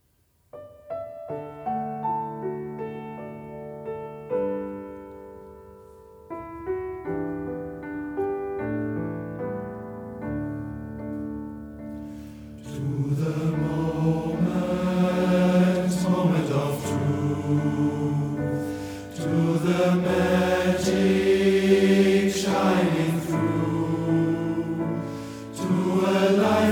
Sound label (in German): Schwuler Männerchor; NDR Talkshow